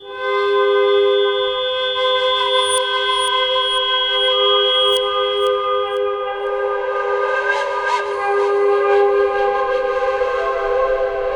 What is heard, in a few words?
Strange Distant Flute, Ethnic Instruments; Sonic Foundry (Sony Creative Software); The Electronic Music Manuscript Collection